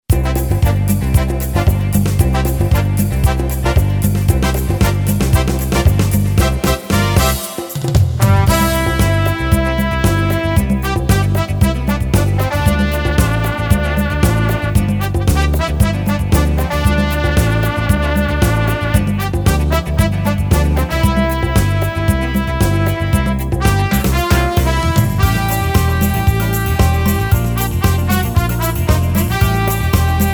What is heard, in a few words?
Brazil Brass